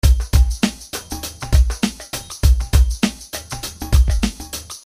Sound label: Amen Swing